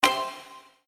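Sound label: Intro Start Spin Button; slots; Wealth Gods2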